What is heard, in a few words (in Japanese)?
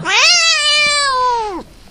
neko nyaaa